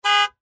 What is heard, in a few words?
Car Horn